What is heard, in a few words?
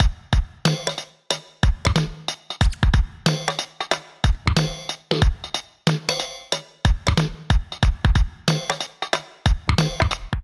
Toy LoFi Kit [92 Bpm], Drums; MIDI Clips; Ableton; Factory Packs; Vibrations; ModeAudio